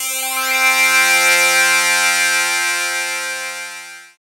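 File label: synth Morpheus; Sound & Vision - Gigapack II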